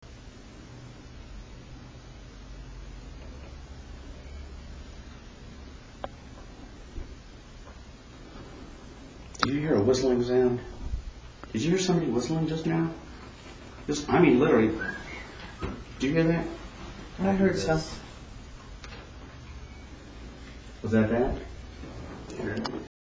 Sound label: Whistleing Sound; Virginia City 2008; EVPs; Ghost Hunt